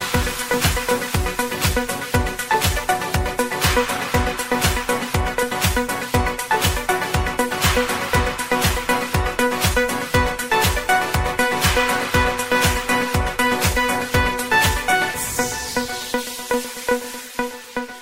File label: Instumental